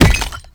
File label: Stone Pillar Debris Hit; Duviri Murmur